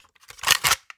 Remove Cassette

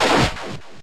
Throat Chop; pokemon reborn e19 2022

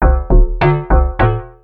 Jingles; otome the exorcist

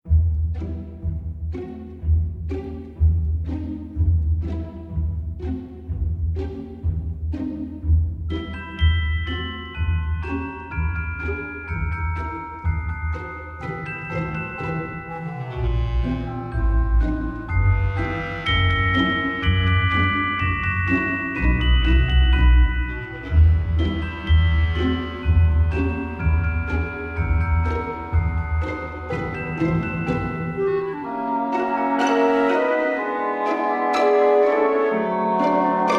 Nut Cracker Music; There Is No Game: Wrong Dimension

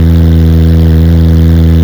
Car High Loop

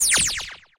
Give Answer

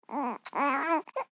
baby three weeks old babble

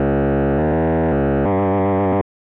Classic Death; Pitfall The Mayan Adventure; SNES

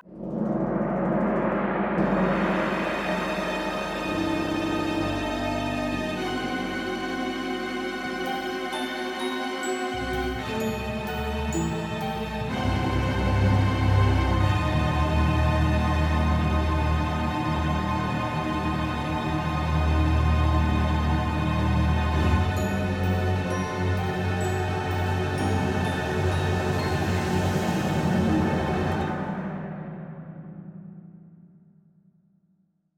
Queen Dialog Music; fat princess adventures; ps4

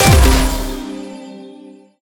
Lap complete; Pacer

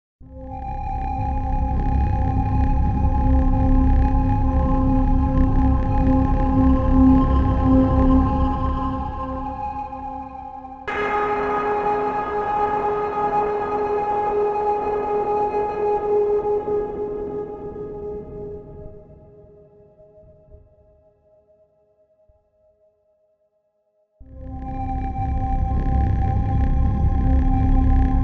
Quest Ambient; dying light gamerip 2015

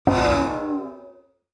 Puzzle Failed; Scarlet Hood and the Wicked Wood

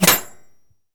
Bullet Impact Metal Light; jasem just another shooter with electronic music windows 2017

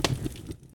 Fire pop

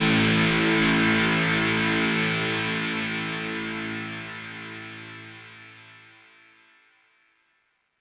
Oneshot Guitar; zombie army 4 dead war; ps4 stadia switch windows xbox one; Guitara

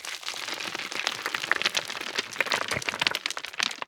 dirt debri; Scrat

